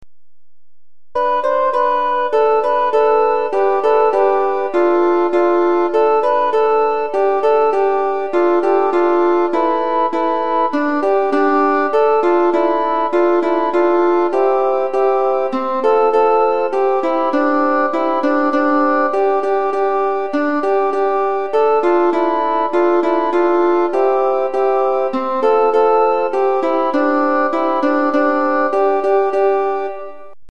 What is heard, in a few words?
chechen folk music